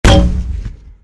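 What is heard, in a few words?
bomb and grenade sounds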